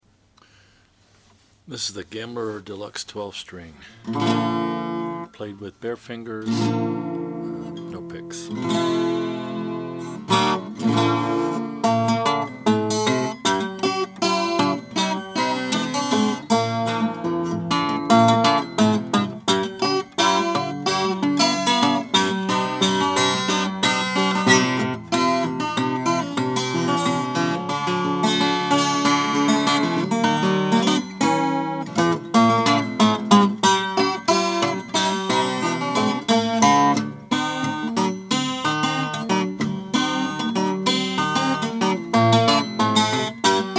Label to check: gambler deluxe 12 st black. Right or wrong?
Right